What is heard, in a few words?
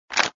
Button Roll; moonstone madness